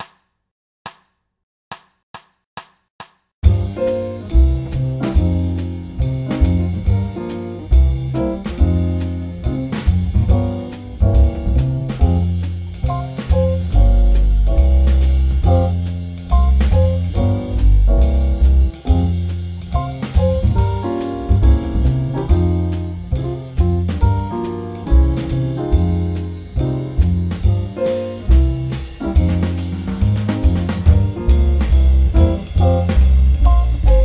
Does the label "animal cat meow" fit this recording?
No